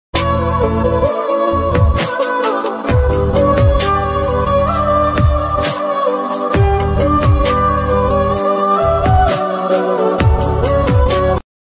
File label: sad music ringtone, beautiful arabic islamic ringtones